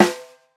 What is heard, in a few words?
hot snare sound f key, snare drum sample tuned to the F note. Loudest frequency: 1118Hz; snare drum samples